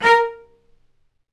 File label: A#3, Spiccato Celli; StSpiccato Celli